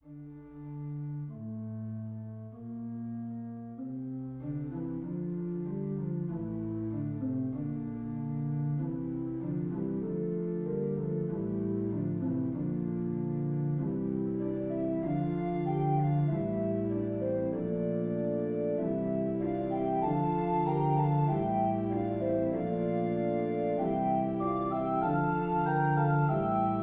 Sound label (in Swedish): Ps ostinato; Ps 358 ostinato